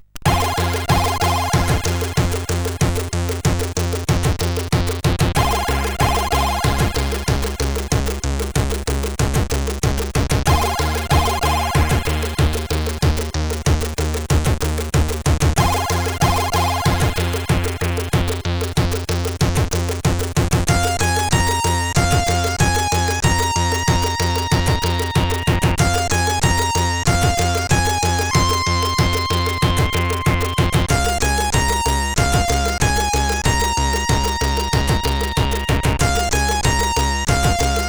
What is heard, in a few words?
intro, sid CSG8580R5